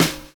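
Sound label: snare to hi-hat; Univers Sons - Kitch 70s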